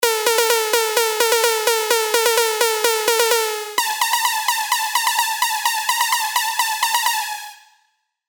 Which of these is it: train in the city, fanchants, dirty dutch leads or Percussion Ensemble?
dirty dutch leads